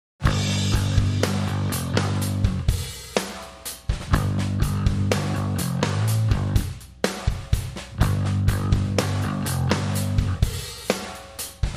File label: drums bass; done gone down